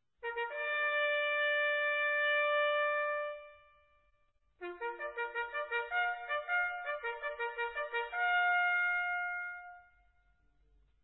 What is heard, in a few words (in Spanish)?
corneta; a paso ligero